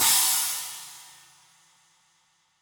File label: SPLASH, Yamaha DD-65 CYMB